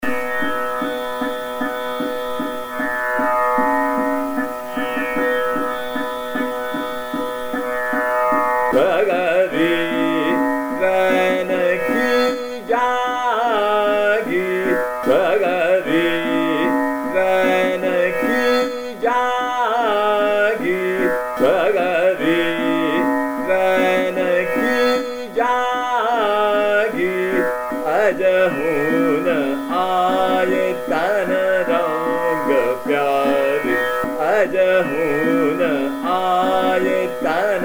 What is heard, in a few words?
Jaldhar Kedar Chota Khyal - Trital; Sagri Rain Ki Jaagi